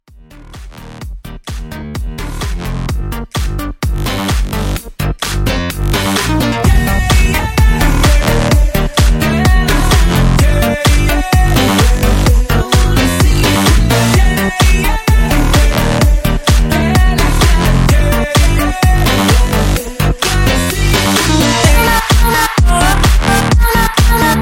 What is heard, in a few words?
Club Hype 128 CLEAN